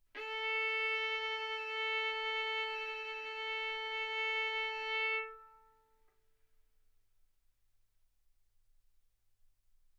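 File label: Violin arco mf A string A4 B4 stereo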